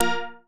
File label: Light Time; Game rip; MiSide